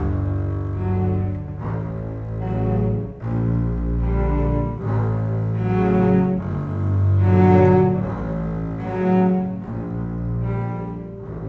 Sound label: Bass Cello, Cinematic